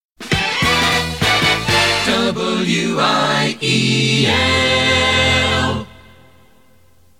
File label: jingle